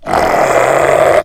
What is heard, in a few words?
wolf growl; were